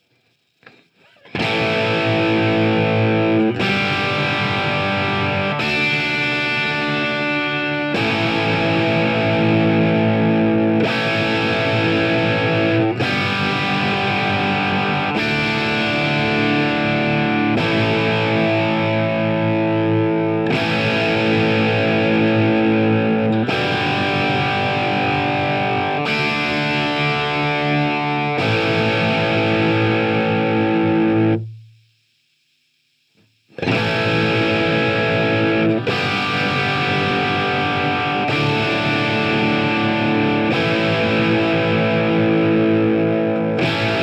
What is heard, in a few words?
Guitar insert